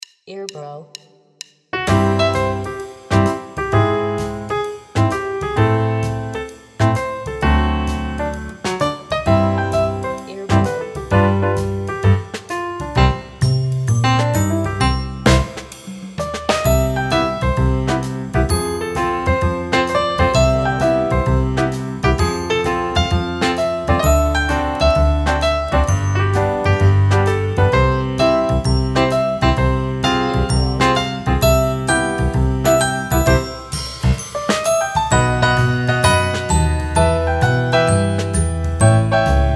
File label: Romantic